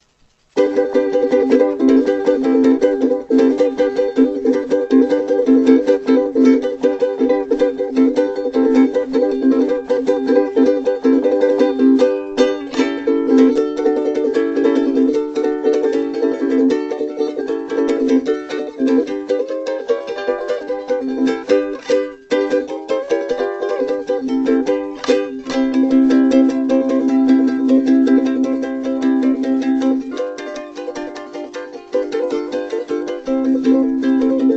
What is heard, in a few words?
ukulele